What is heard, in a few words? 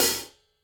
HIHAT 14 brush loose; loose 2